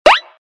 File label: pyui